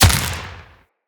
Deathshot, Bullet Impacts; Weapons

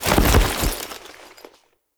Foley Land; Warframe; Characters; Kahl

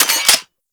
Heavy Shotgun Mech B; Warframe; Tenno

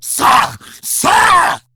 Attack, Battle Barks

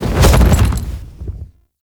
Horse Jump Heavy Double; Vehicles; Foley Actions; Warframe; Duviri

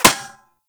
Bullet Impact Metal; Warframe; Weapons; Bullet Impacts

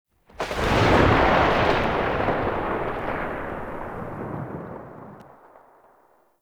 Exalted Sniper Tail; Warframe; Frumentarius

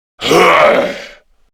Death Grineer Male; Warframe; Battle Barks

